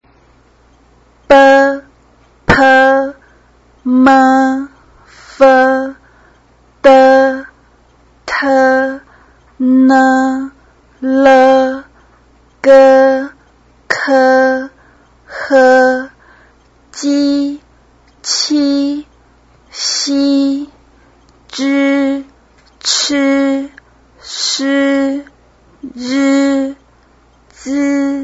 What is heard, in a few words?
bpm single sound